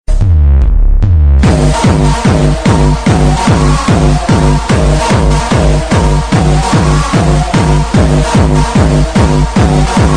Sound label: Jumpstyle